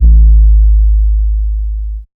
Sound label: Bass, Dubstep 808 Basses; GhostHack